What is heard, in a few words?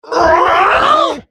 spellcast effort